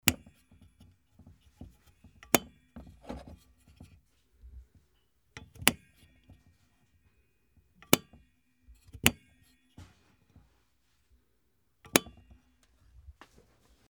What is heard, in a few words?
old light switch